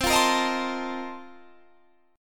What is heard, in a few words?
Bb Major 7th Suspended 2nd Suspended 4th